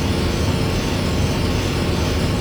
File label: hangar loop; progen; Earth and Beyond; EA Games